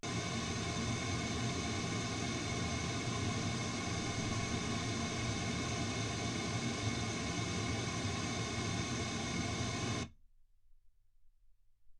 pink noise 48kHz

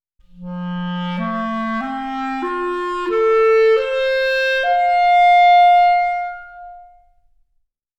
clarinet; OmniSource

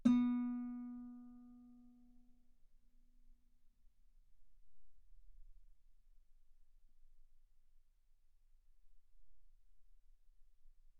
Guitar ff B3

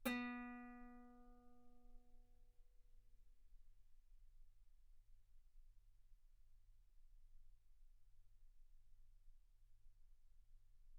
Guitar ff B3; sul B

